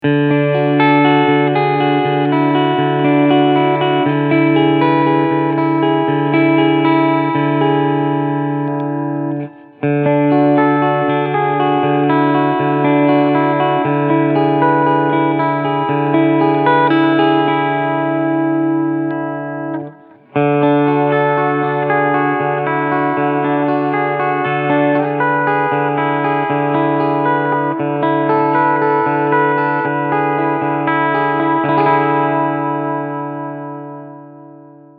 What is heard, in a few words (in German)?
EMG Cleansound beide PUs; Harley Benton Fusion III EMG HT Roasted SBK